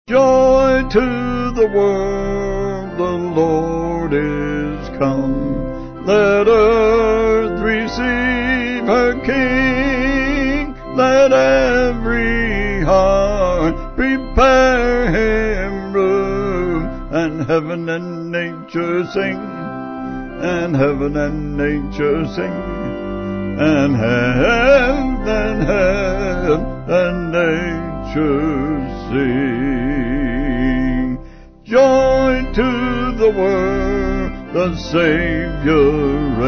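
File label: Vocal Organ, church music backing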